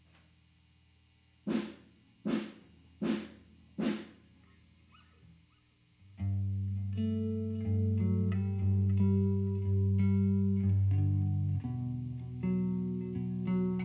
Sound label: M160 Bass Guitar Amp